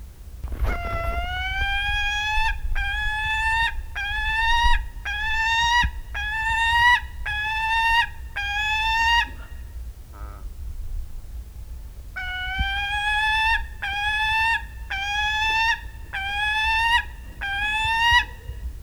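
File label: Falco cherrug adult; Saker falcon; Adult female mob a human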